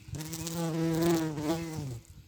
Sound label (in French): Bourdon jaune vol med; Vols Bourdn abeilles